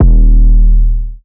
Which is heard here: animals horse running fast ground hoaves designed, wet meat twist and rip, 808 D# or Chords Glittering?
808 D#